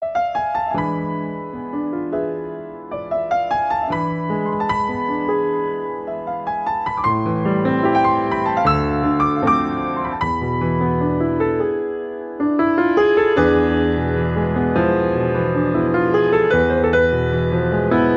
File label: Loneliness Piano